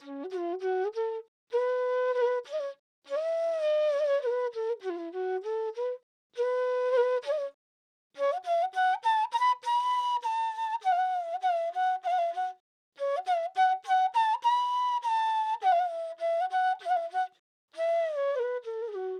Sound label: Dancehall Flute Fmin 100 BPM